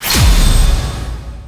Effect sound for Level Up in result

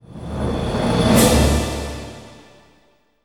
quest complete

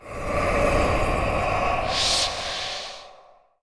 voice idle; quintooth

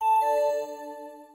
sms wait; gamble

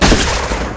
Wild Shot; Effect

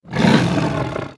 attack, Monster

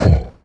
Damage, Monster; Yosu

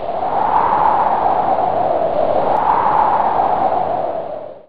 Projectile Blizzard; nox

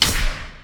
Consume Rift Stone

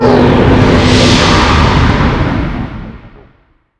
voice die; mell

